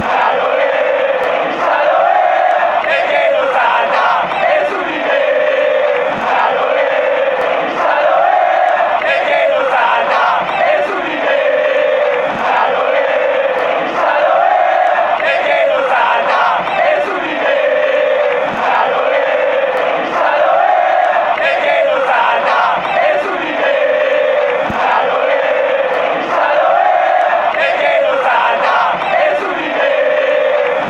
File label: english, fan chants